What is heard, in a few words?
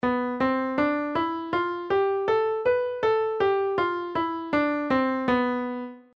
locrian mode